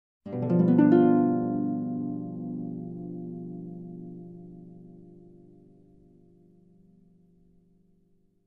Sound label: Harp suspicious chord strum